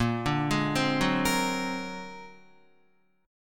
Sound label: Bb Augmented 9th Bb, Guitar; Bb+9 arpeggiated; JGuitar